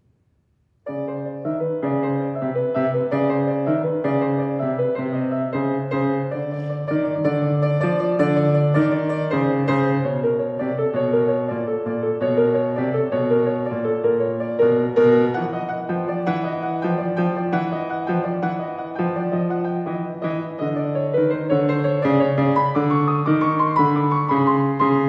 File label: rituals of insects piano